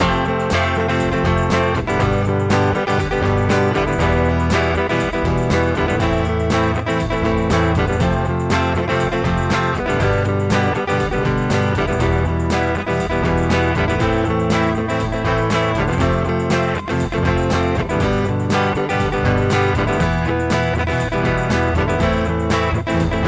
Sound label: Electro Ac